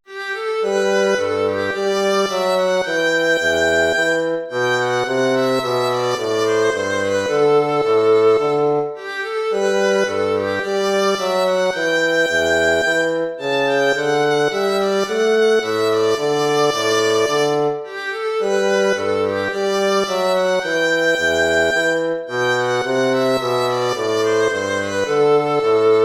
gavotte; haendel georg friedrich

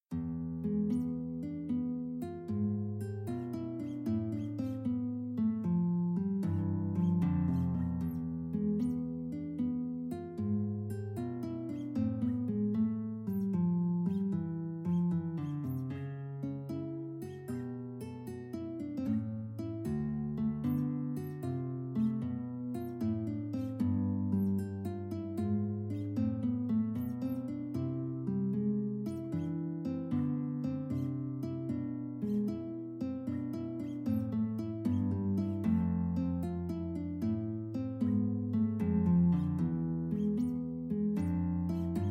blues

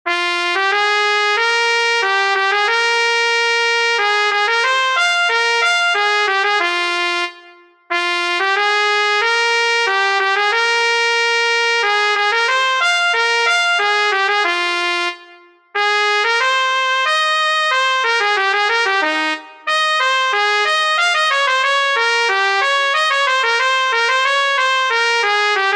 polonaise trumpet

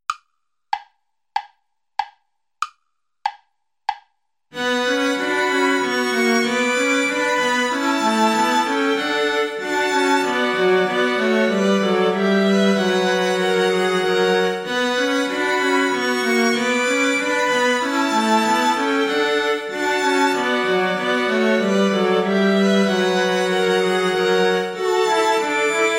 play along without violin

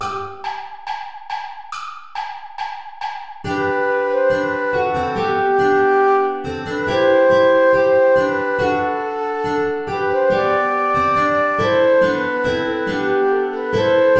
ballet play along without guitar

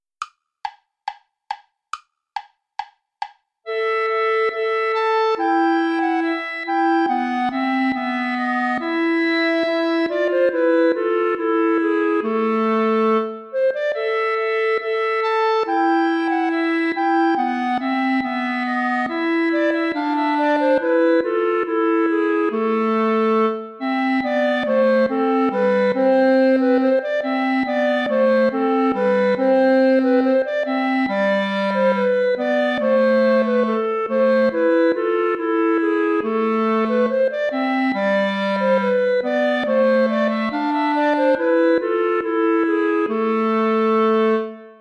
allemande play along without clarinet

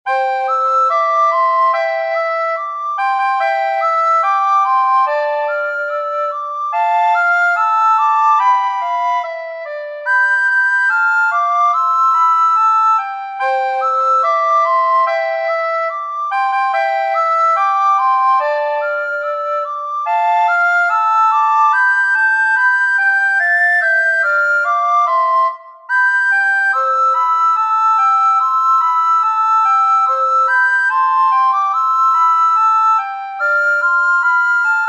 jingle bells; pierpont james

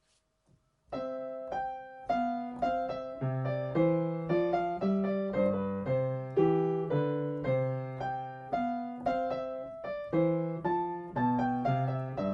menuet and variation

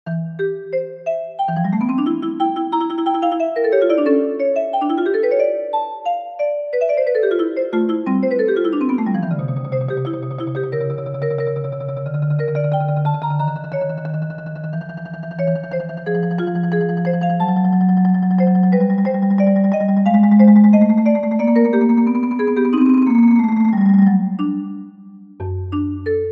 recitative chorus for marimba strings; bach johann sebastian und siehe

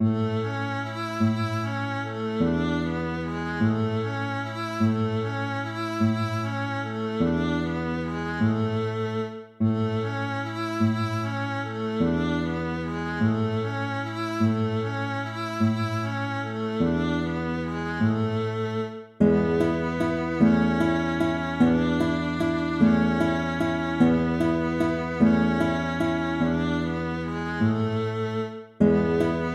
waltz; Carulli Ferdinando

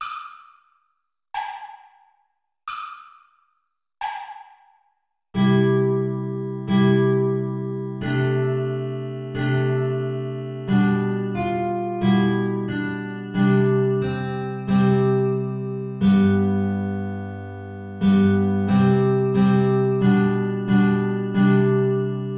play along without guitar

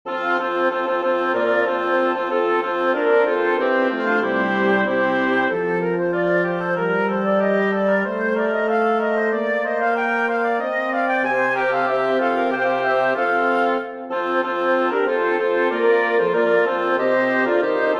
for woodwind quintet

